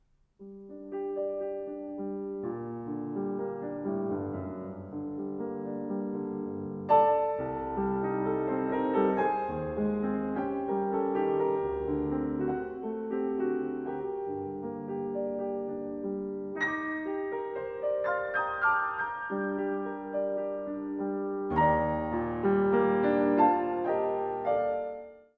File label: Etude Tableau g-moll Moderato